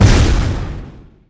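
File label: flak core explosion, weapons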